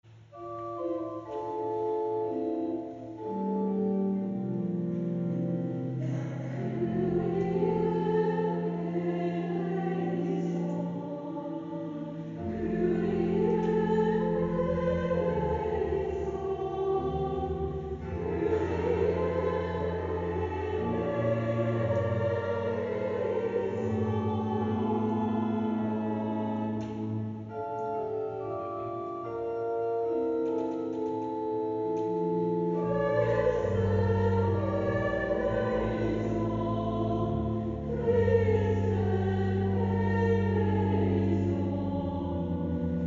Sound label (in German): Kyrie aus zweistimmiger Messe in F; zweistimmiger Jones Messe in F